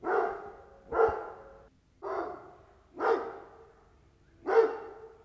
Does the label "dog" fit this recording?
Yes